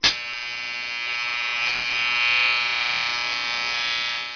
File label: electronics